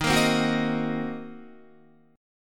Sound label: D sharp Minor 6th D sharp down Guitar; strummed